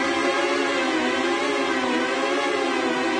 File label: Swedish Fish Candy Loop; candy crush saga gamerip